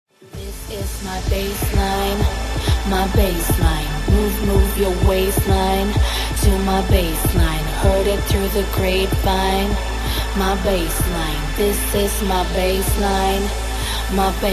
Bassline; tribe core

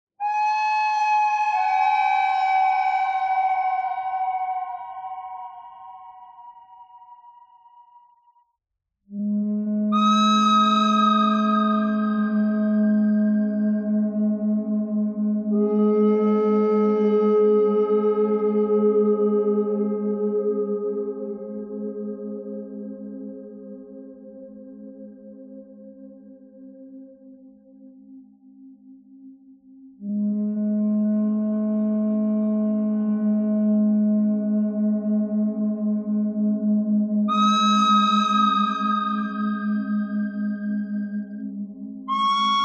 bird thread noise; noreverb

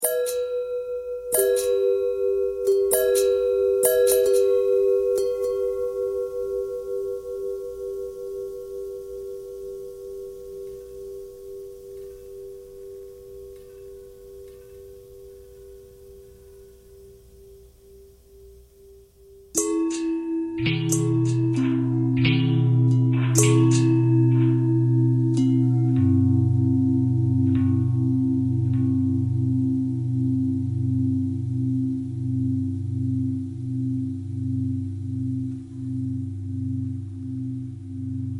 long bell long bell thread simple, bells

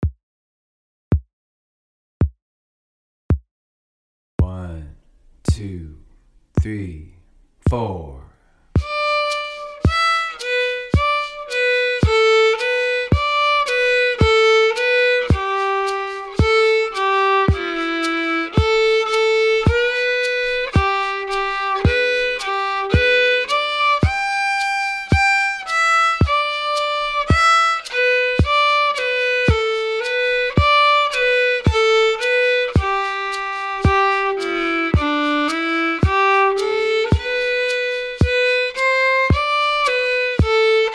Bird in the Bush Full Mix, fiddle; Level 1

